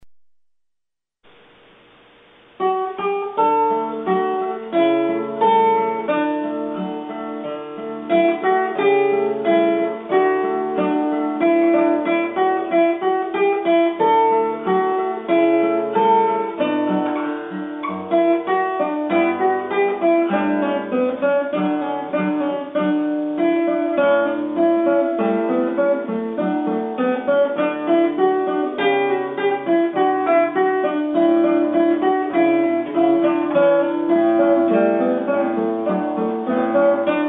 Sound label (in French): sonatine en re majeur